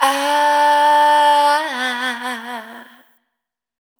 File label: Extension, Single Voice Melodic Loops; Singles